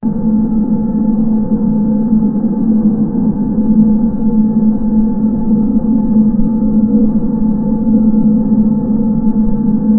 ambient sounds inside a, submarine

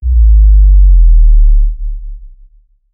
modern movie trailer bass, bass drop

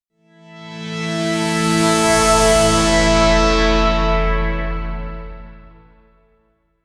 dramatic electronic ident; metallic whoosh

